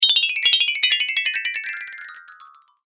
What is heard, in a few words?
Falling Magic, sound